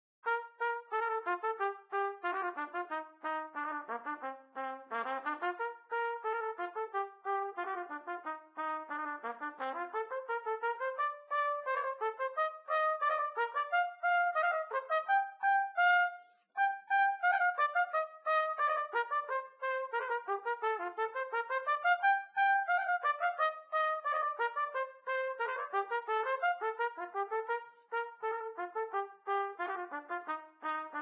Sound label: Trumpet Training; Instruments